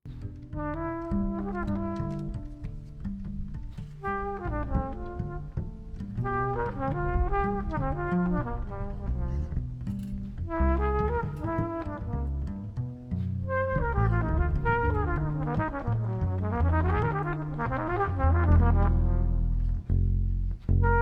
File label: Quartet Interlude